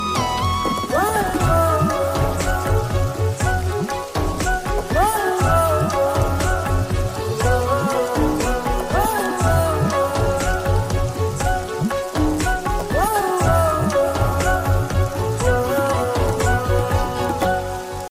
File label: sweet music ringtone, bollywood ringtones